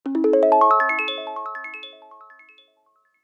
Apple Watch Nightstand Alarm

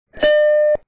Mac Plus Startup

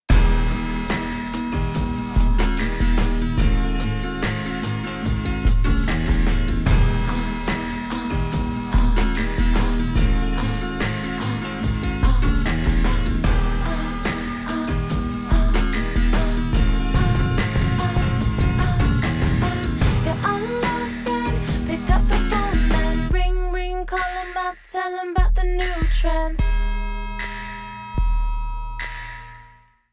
Sleigh Bells

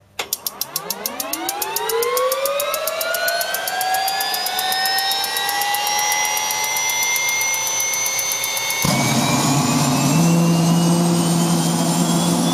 Bell 206 Jet Ranger Startup

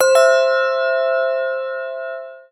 Triangle (short), ringtones

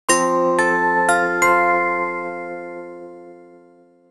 Announcement Chime

